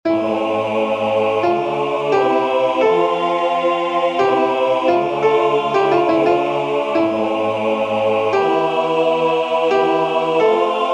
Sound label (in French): Soprane